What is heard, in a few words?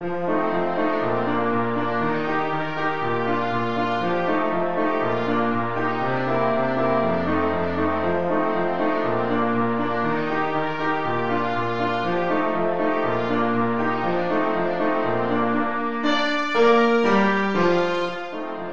can can trumpet